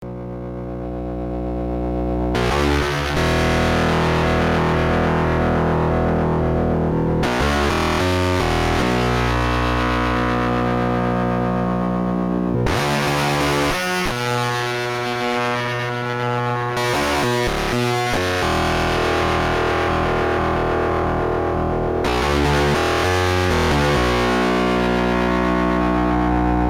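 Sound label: fuzz guitar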